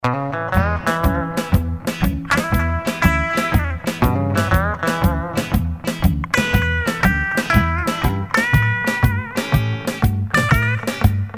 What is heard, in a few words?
blues e